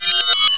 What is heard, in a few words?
ITEM KEY; PwrSlave